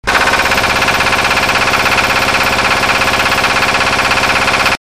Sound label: 2000rpm